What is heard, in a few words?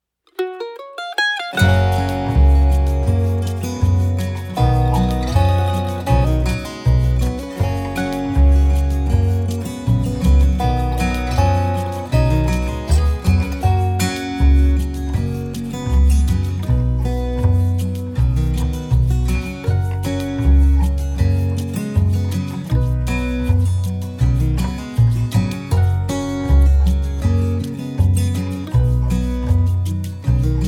Autumn Rain Instrumental